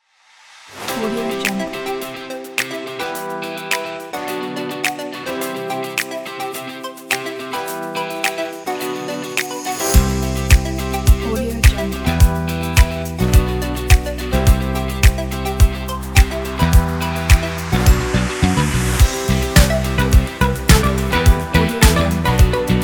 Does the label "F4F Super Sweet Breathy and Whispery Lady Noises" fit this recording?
No